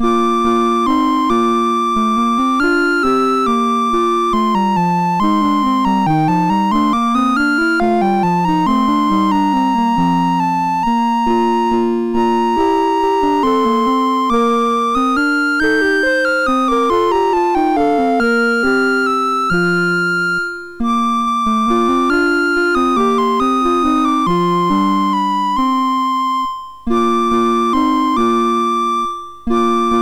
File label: MOS6581; MOS6581R3